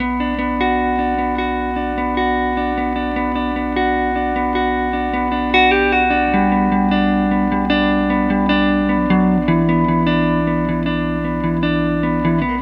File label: Guitar Hum Raw